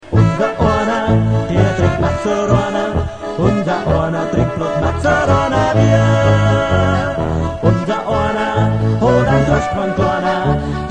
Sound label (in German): lied windows; klingelton